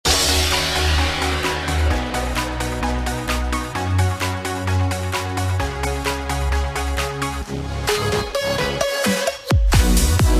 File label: Pop House